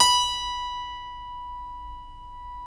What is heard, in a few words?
B 2 CLAV; USB Soundscan vol.17